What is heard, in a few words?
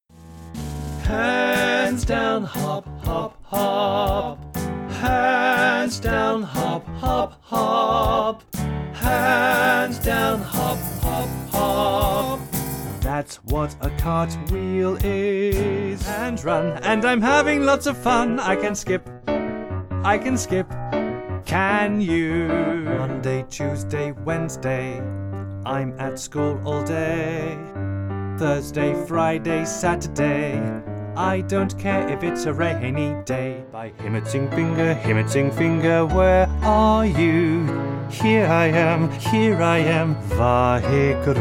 Voice-Over Children Personal Songs